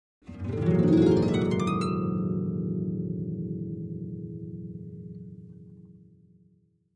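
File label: Harp Ascending Glissando 7th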